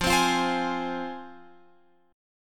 F Minor, F, Guitar, Open F; strummed

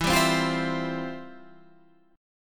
F Major 7th Suspended 2nd, E, guitar

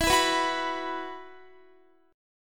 E Suspended 2nd, Guitar; strummed; Esus2; x,19,16,16,18,19; 4F,4A,5C,5G,5C,6E

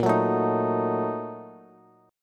Bb Major 7th Suspended 4th Sharp 5th, Bass; strummed

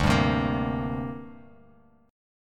D 7th Suspended 4th Sharp 5th down Bass Standard; D7sus4#5 strummed

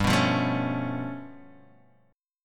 G 9th Flat 5th, Guitar, Open G; down

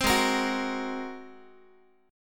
G sharp 9th G sharp, down, Guitar; strummed; chord; 15,16,13,16,x,x

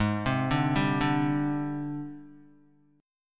G sharp Major 7th Flat 5th, Guitar; arpeggiated; chord

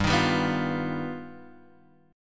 G Augmented 7th; G+7 strummed; x,x,x,0,3,2,1,2; JGuitar

